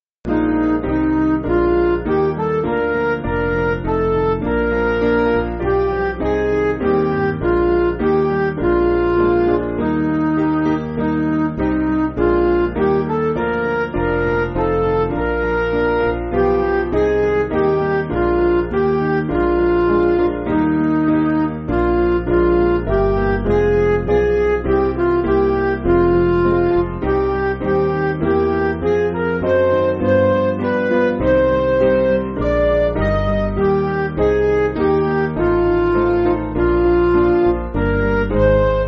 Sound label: Band, Music Backing